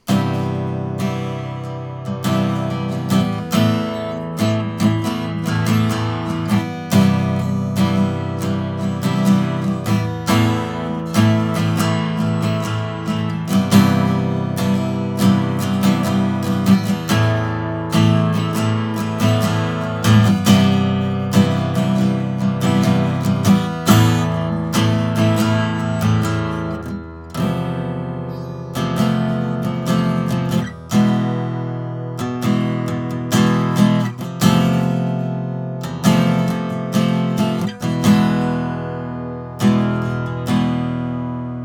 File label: Acoustic